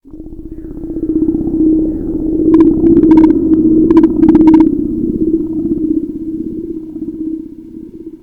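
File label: zombie idle; svencoop; sence; source of life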